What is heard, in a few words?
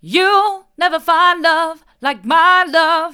hard, vocal